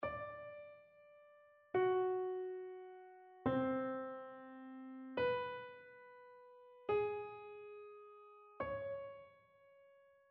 interval chains

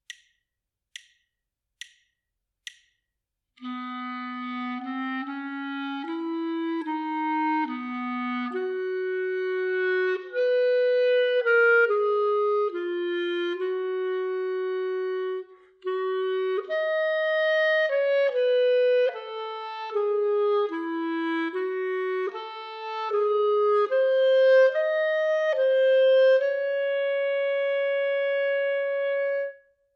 melodic dictation

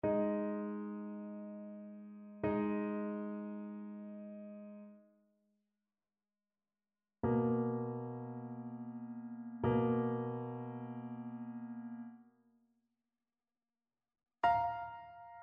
intervals

